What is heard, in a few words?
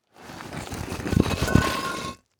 Impact Brick Drag Through Dirt And Rock Medium